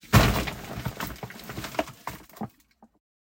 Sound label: Wall Break